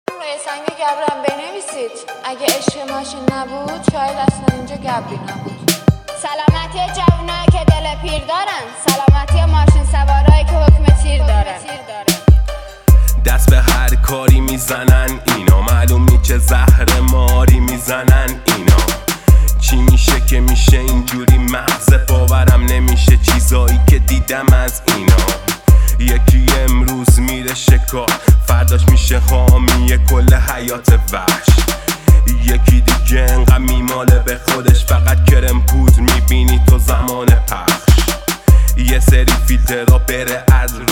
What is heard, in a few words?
trap